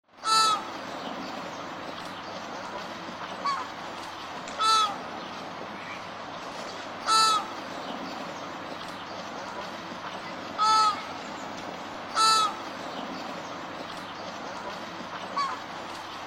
Flamingo

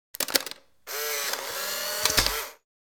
polaroid

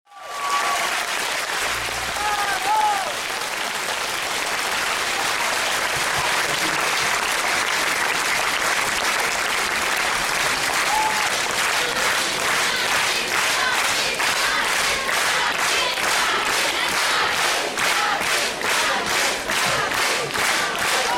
"bravo" "bis"

